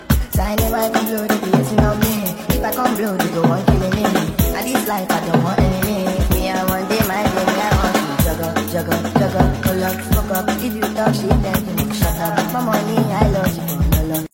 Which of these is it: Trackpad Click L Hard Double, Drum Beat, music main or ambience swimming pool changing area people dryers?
Drum Beat